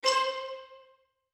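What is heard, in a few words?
woodwind string xylophone note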